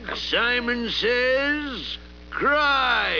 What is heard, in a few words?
cry